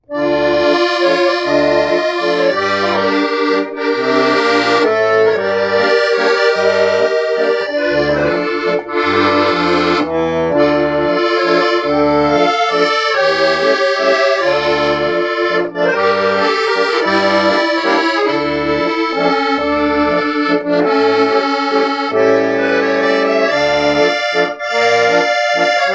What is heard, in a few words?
Waltz, accordion